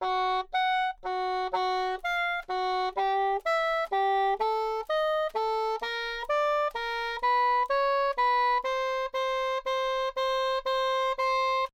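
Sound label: english-horn F sharp 4 phrase mezzo-forte normal; solo instruments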